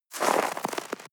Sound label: step snow walk single